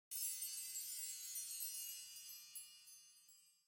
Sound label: regular Landing; godsoffortune